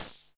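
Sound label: hi-hat, analog, Rhythm 33; Sound & Vision - Gigapack I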